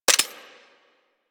Hand Shotgun Mech

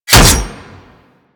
Barrage Hit Metal; Warframe; Warframes

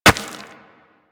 Operator Run Rock, Footsteps; Warframe

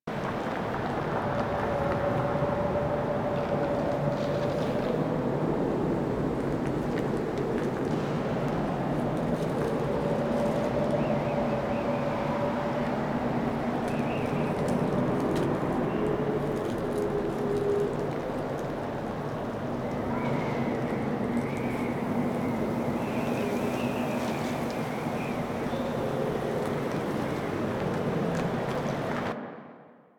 Outpost Defense Ext Amb; Warframe; Ambience; Corpus; Crp Outpost Defense Three

